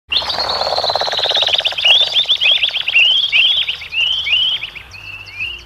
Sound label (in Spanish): perdiz chilena